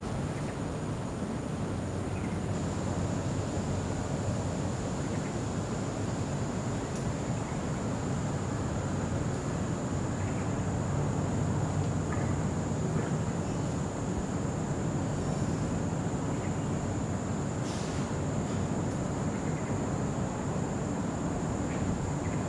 hort park southern ridges forest air tone leaves rustle heavy traffic; singapore ambiences; Sonniss GDC